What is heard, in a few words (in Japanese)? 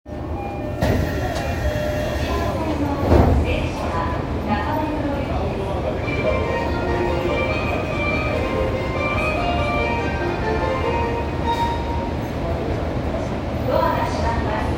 日比谷線広尾南